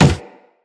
Run Wood, Footsteps; Characters; Operator

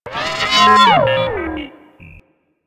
Leech General Dialog; Leech Osprey

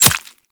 Arrow Impact Flesh; Warframe; Bullet Impacts

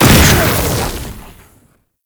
Concrete Archgun Projectile Empowered Start; Warframe; Archwing

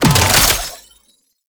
Pistol Reload; Weapons; Warframe; Tenno; Temple Signature

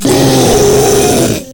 Proto Infested Ancient Vocal Attack; Warframe

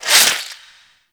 Crew Ship Pilot Finisher Stab Out; Railjack; Grineer; Cinematics

